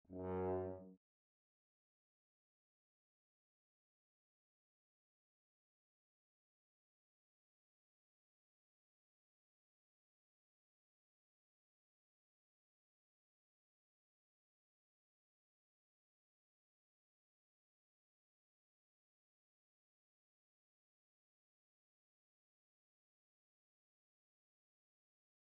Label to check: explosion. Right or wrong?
Wrong